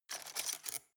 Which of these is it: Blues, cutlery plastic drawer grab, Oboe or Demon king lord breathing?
cutlery plastic drawer grab